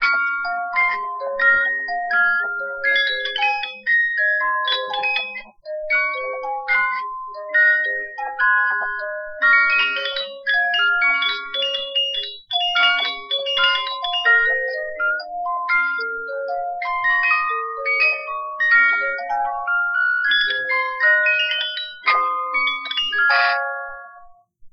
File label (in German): Spieluhr; kinderbuchabteilung